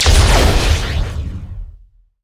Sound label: hypercharge; LoL; jayce